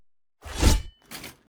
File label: npc crusader strike